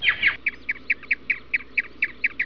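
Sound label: mockingbird cherwk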